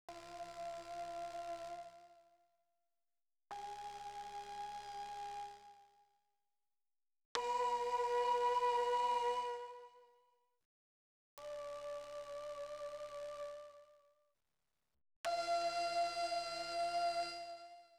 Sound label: Mellotron Female F+3, Choir & Voice; Klaus Schulze Collection